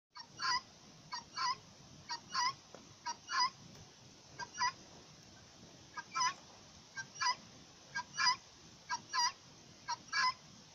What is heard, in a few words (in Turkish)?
Iran Bec Tavugu Nasil Oter